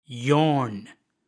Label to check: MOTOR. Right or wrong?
Wrong